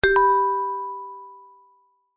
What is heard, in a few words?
multimedia alert notification ui ping chime mallet like positive